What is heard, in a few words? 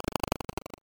Transition